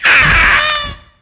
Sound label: harpy attack